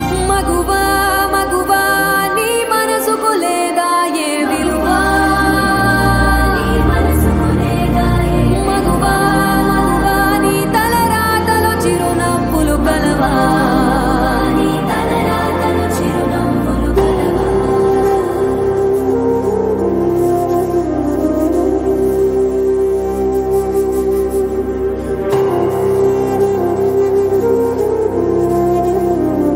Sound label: Female Version BGM Ringtone; Vakeel Saab; Telugu